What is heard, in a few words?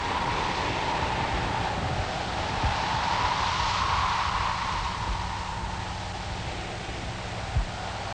WIND